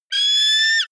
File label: offspring eagle call, voegel; natur-tiere